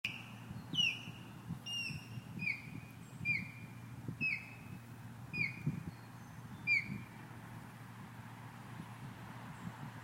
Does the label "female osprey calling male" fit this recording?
Yes